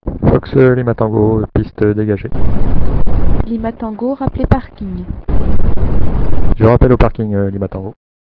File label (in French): taxi